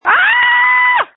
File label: screams